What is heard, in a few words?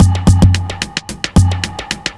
block rock kit